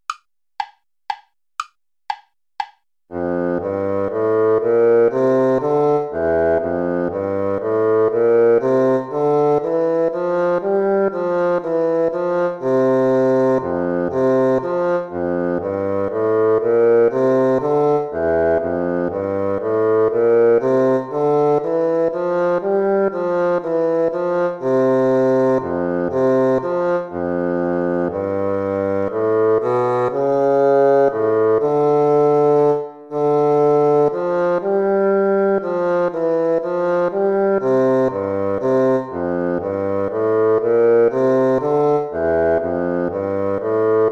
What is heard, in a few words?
baroque minuet major play along